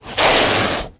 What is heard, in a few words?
Ocelot Hiss Medium